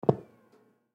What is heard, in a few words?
Arcade Game Unplug, Arcade Game Unplug Power Down; Technology; Arcades; Games; Blastwave